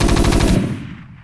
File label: Assault Cannon Fire; lancer weapon fx